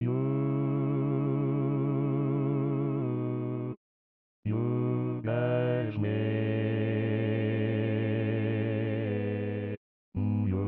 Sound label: bass in Cm